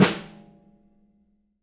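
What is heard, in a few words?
jazz snares; Sampleheads; Peter Erskine Living Drums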